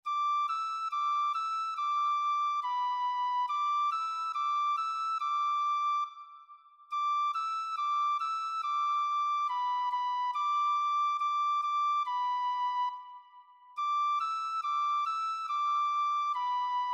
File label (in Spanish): flauta; Suena, suena, flauta; Nota Re alto-Firmamento. Nota Mi alto Estrella Si Cielo.